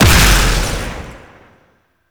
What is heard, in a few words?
Explosion Small; Weapons; Warframe; Duviri; Incarnon Adapters